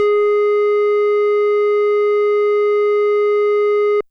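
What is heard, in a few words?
FM Sq Dist DX100 G#3; square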